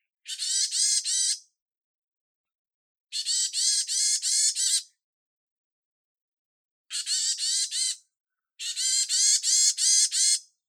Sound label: yamagara, birds